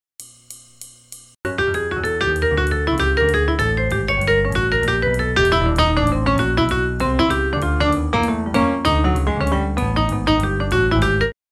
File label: 8 bars; tp aratori vol 1